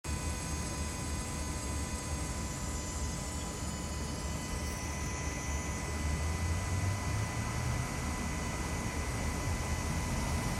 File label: Mazda CX-5 stalls immediately short circuit noise